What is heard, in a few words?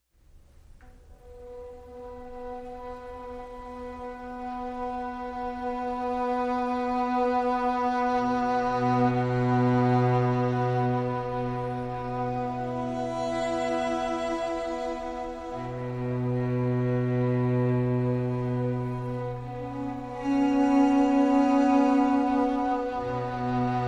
Underscore